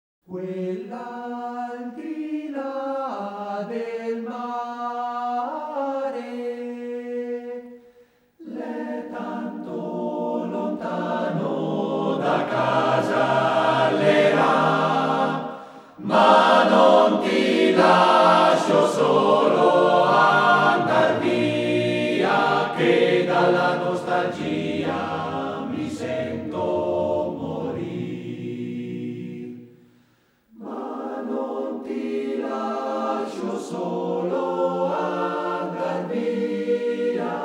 Choir

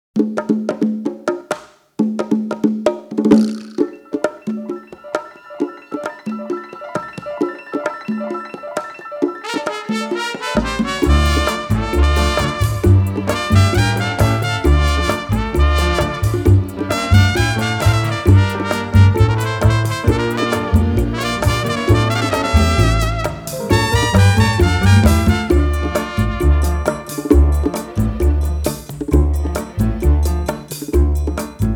Percussion

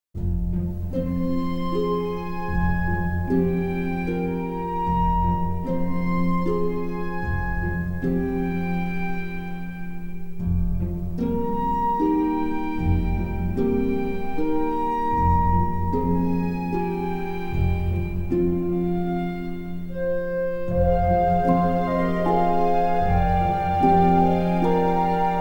Orchestra

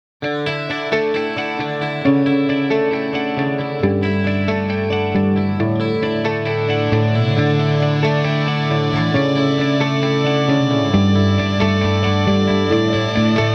Electric Guitar Hard Rock

